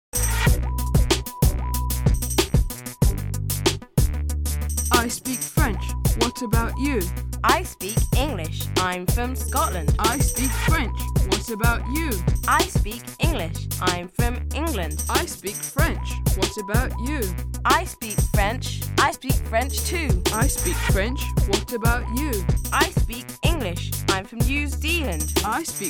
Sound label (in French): Chant pays anglophones